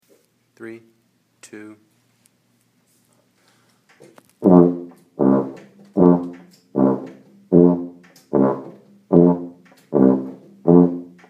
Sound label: Tuba